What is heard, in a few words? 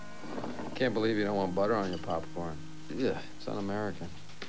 popcorn